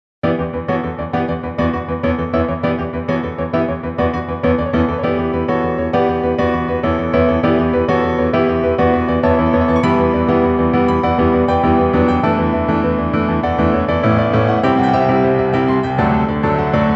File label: piano Battle